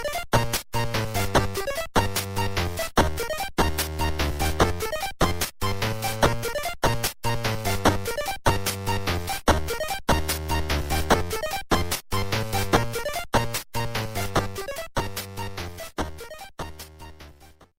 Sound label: Game Over